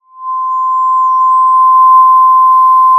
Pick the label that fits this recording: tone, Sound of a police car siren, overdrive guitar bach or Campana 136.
Sound of a police car siren